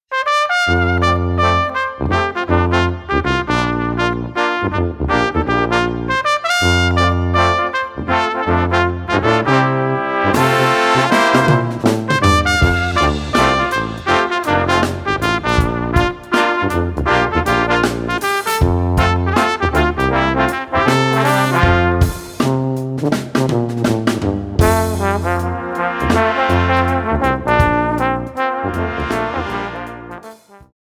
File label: Brass Mood